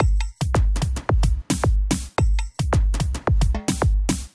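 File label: Moombahton loop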